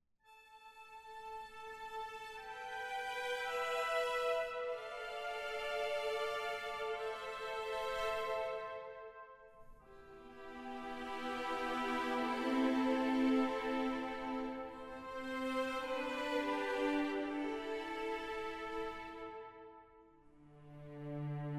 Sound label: travel neutral; Mount&Blade Warband